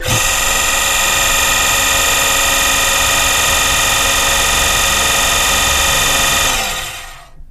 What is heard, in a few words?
Jet Boat Water Pump Th, boat thrust